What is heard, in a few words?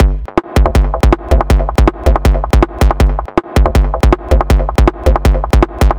160bpm Groove